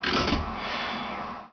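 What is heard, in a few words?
Emergency Brake, Train; OpenBVE; Expreso CIWL Fleche DOr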